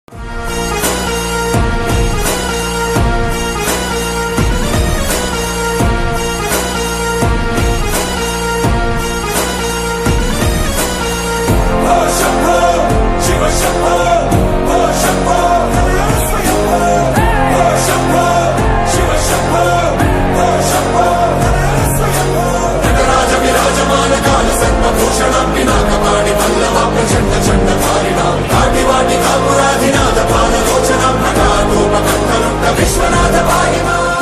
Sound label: movie ringtone bgm; Akhanda